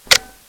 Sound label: Shutter; ZTE; R90